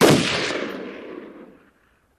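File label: AR15 Machine Gun Single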